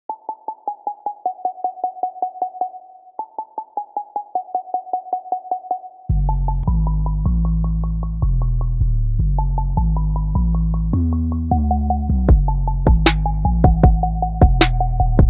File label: UrbanTrap